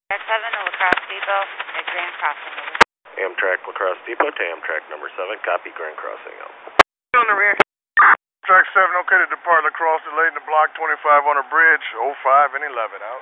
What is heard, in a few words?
Amtrak